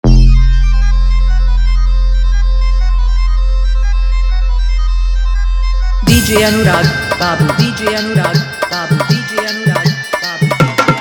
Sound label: Krishna Bhajan Hard Dhollki Jhankar Dhollki Bass Dance; Janamastmi